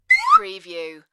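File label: Slide Whistle Rise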